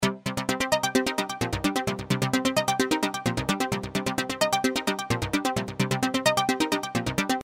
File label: loop